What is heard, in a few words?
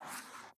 Ink Sound Stroke